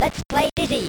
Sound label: 8 bit; lets play dizzy